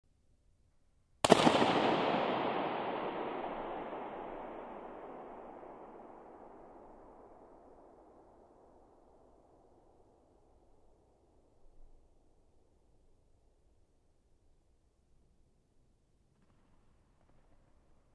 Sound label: balloon burst at edge of dome with mic on opposite side whispering gallery; Trevor Cox; SoundBook